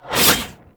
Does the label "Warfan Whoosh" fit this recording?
Yes